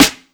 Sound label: shiny snare drum sample c sharp key